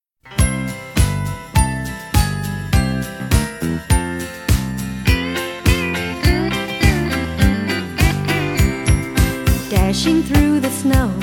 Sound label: Jingle Bells